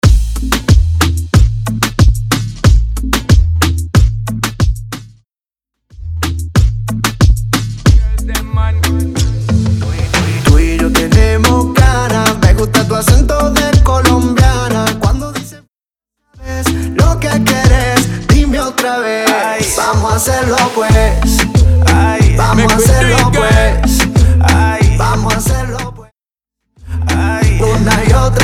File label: Intro Outro Clean 92 BPM Dancehall